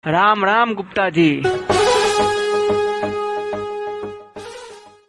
hindi

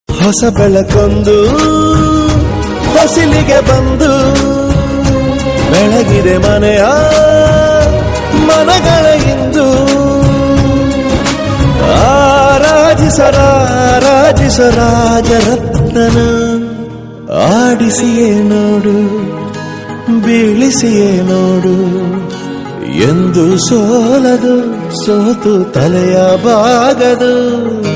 songs

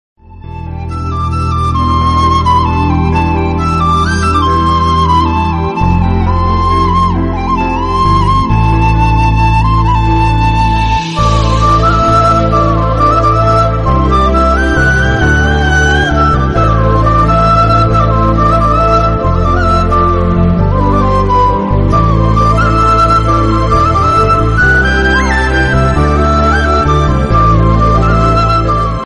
instrumental flute

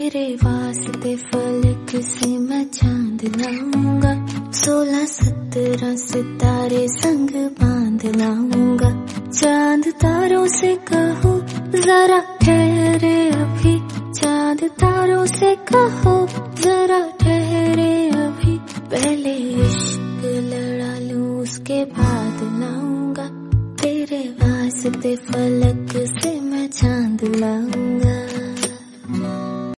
female version hindi song

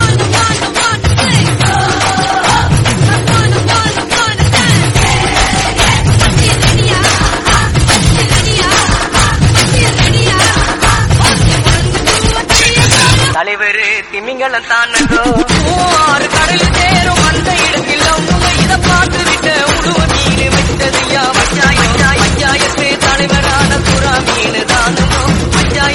mass bgm

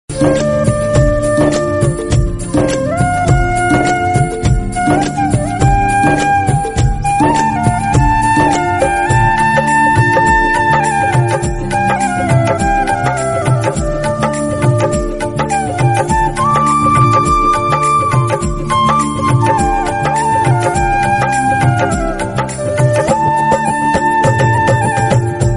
ram murli dhun flute; ramayan; shri ram

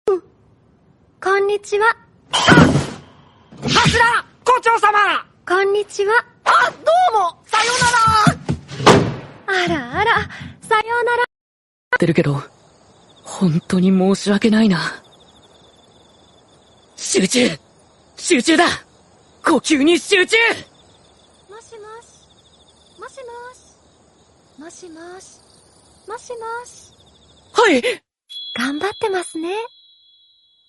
lofi; mha

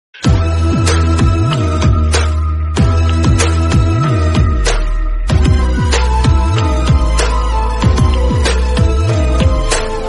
horror theme, horror, remix; darr

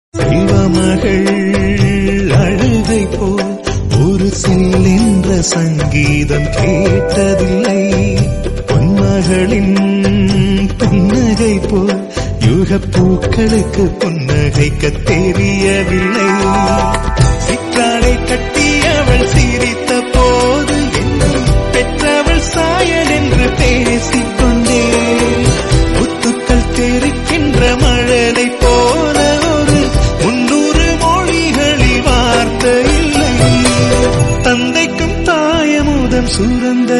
romantic tamil

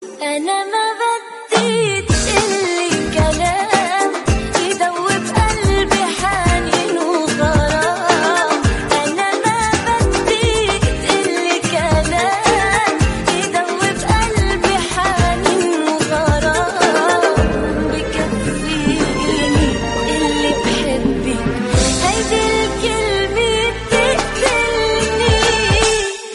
arabic islamic

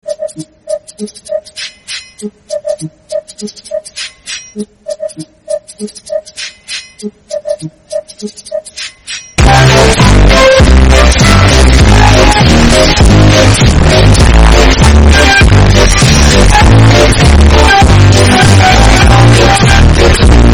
earrape bgm; thomas the tank engine

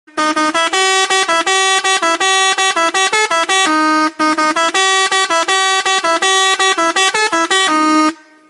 bus horn

